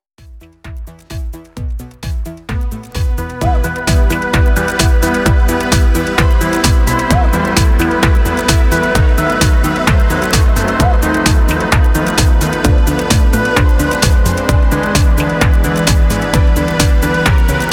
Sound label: Piano For Strings